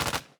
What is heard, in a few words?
heroes attack; goblidrone